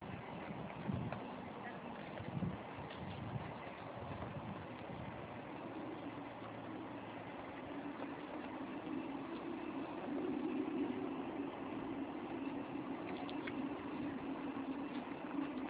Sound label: rolling